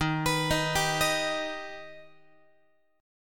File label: D sharp Augmented D sharp Guitar; D#+ arpeggiated; x,11,14,13,11,14; JGuitar